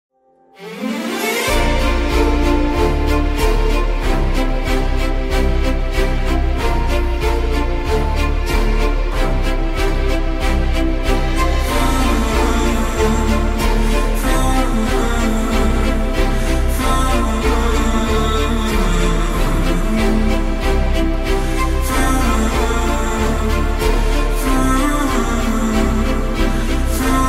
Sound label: malayalam movie BGM; home